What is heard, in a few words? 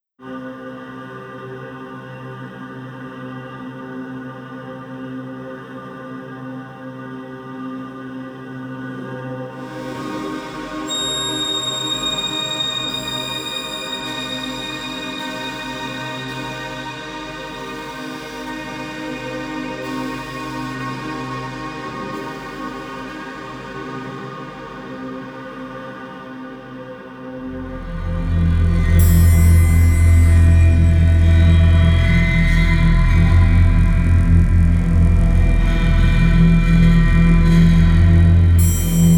Anxious Energy no Drums 100